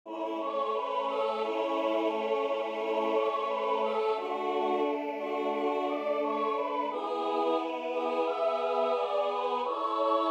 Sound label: jingle bells; pierpont james